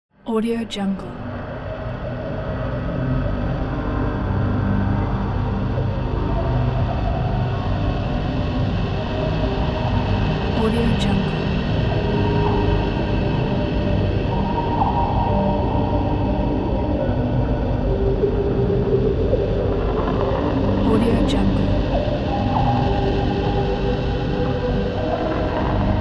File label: underwater horror ambience; phillipmariani